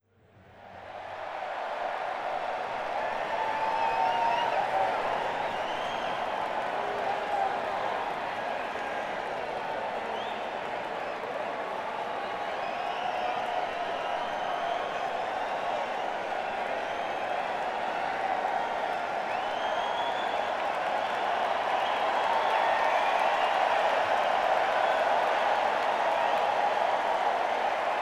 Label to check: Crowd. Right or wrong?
Right